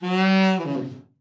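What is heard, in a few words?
TENOR overblow FALL, Sax; TEN-OVB FALL; Giga Samples Collection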